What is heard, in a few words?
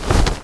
Item Armor